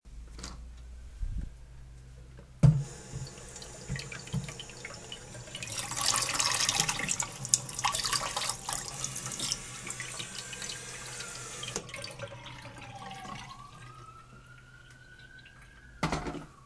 cup of tea preparation soundscape

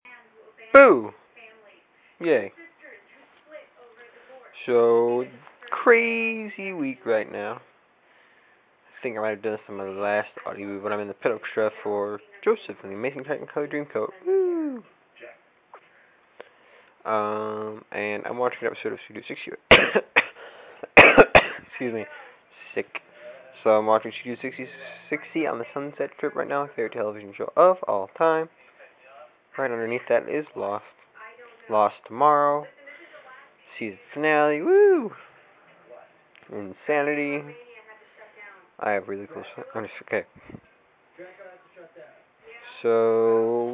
cough cough

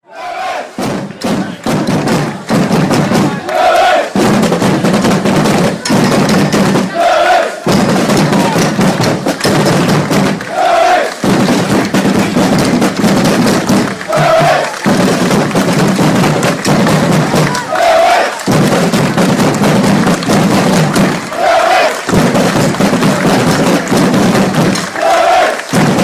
drums of victory

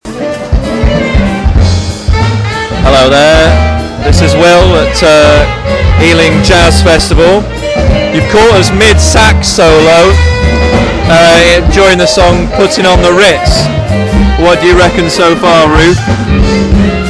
jazz festival

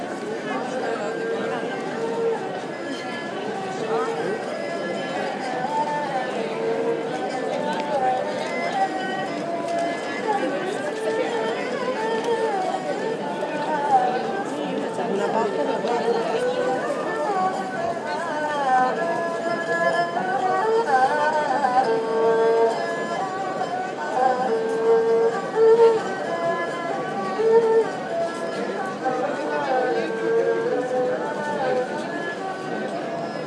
violin

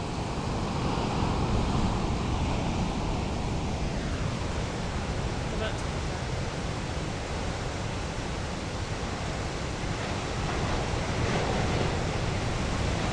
challyborough waves

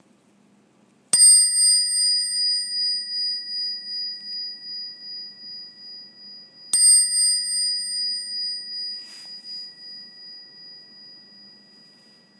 brass bicycle bell

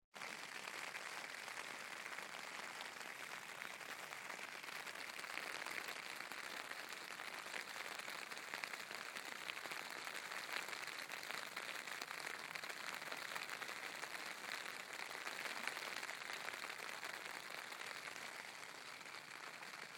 camping

